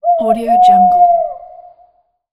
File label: halloween owl